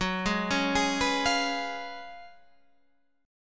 F sharp Major 7th Suspended 2nd Suspended 4th, Guitar, Standard; F#M7sus2sus4 arpeggiated